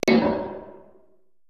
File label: PERC MIX, Retro Sampler; Best Service ProSamples vol.55; AKAI